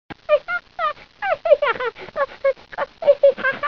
小孩的笑声

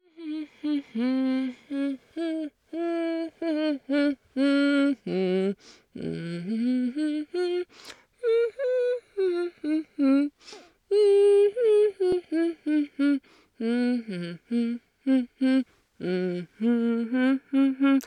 女人哼哼声; renlei

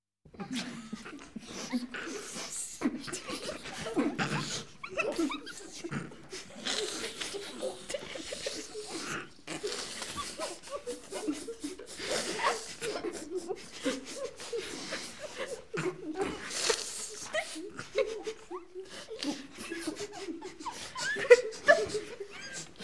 偷笑(女众人、成人)

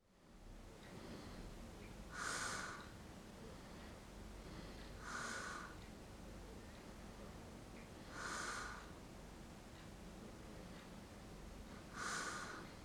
睡眠(鼻孔堵赛、呼气)

